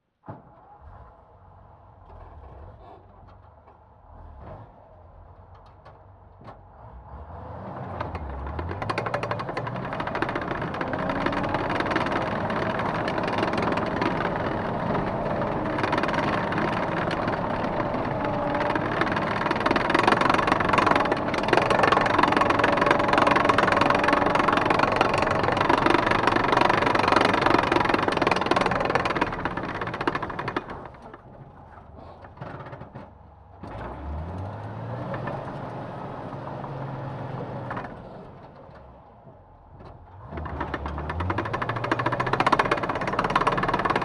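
M10, Tank, Onboard, Start, Fast, Drive, Stop, Off, Tracks, Right; Tank Destroyer 1942; Pole Position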